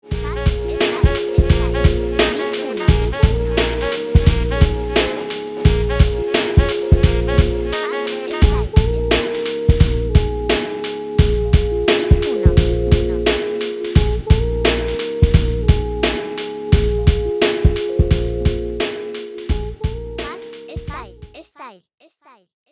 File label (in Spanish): Muestra Beat